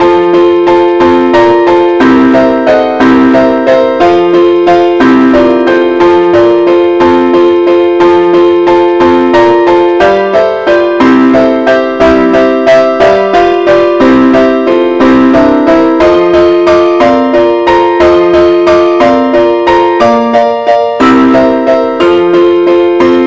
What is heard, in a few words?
compare Chords; angelvoices